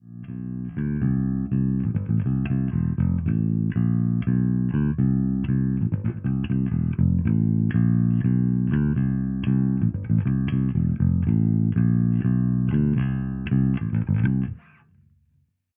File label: Zoom B2 Four B Man 100